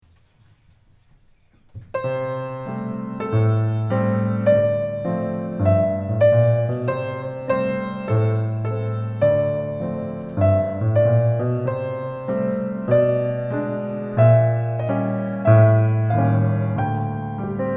Jazz Walk, recital

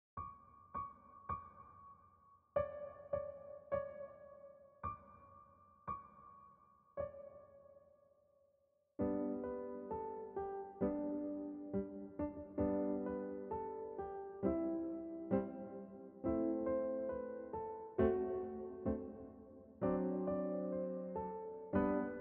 Jingle Bells

